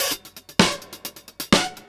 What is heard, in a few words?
Funky 16 fx 127, Beat Loops; Zero-G; Monster Beats